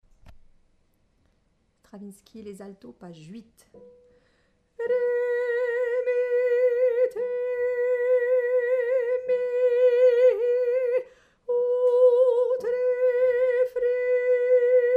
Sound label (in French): psaume Alto